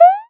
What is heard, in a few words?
jump